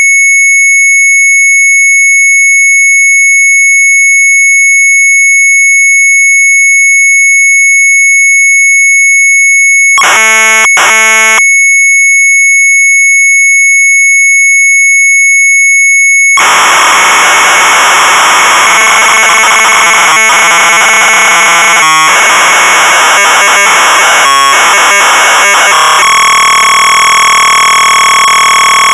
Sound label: MZ800 Tape; BASIC 5025S; sharp; retromelsarchive